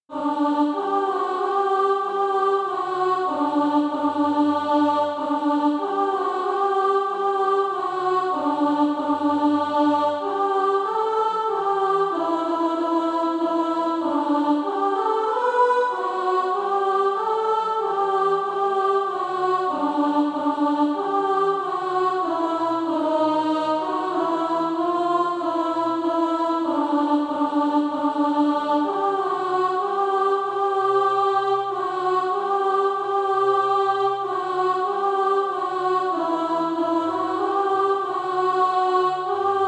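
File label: alto, Practise Music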